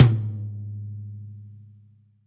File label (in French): Tom medium